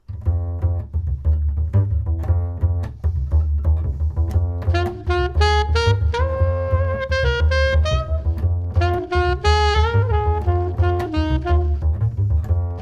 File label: solo sax